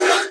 sapper snap

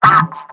laugh short; Pyro; mvm

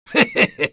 laugh short, es; Sniper

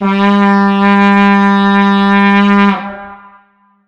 Vuvezela

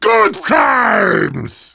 positive vocalization; Heavy

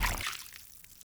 stagger slosh; Taunt demo

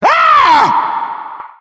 headbomb hit

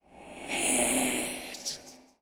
sword idle

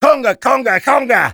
conga fun; Taunt demo

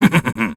laugh short, es; Sniper

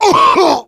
Heavy pain sharp

